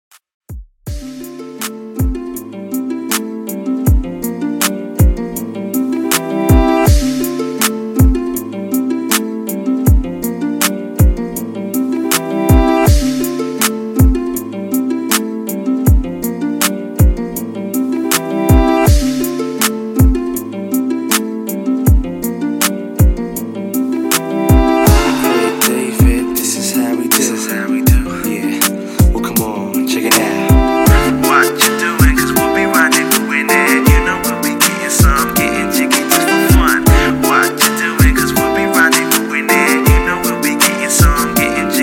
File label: Old School Redrum 160Bpm